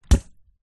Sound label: leisure inflated beach ball bounce water